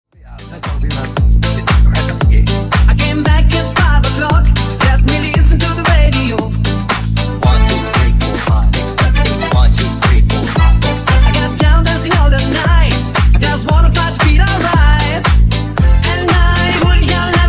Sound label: Funk Nu Disko 115Bpm